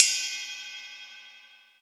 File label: ride bell, Yamaha TG-500